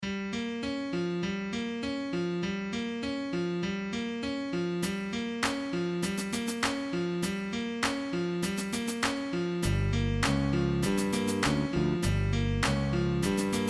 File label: Shitty Trap Beat